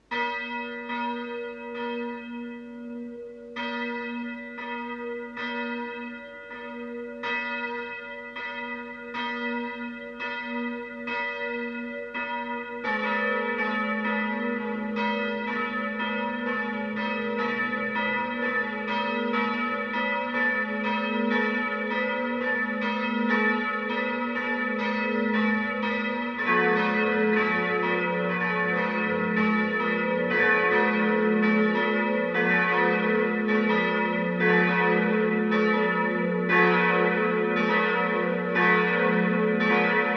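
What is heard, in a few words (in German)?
Tonaufnahme e1 fis1 a1 h1, mehrstimmige Geläute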